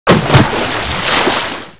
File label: plons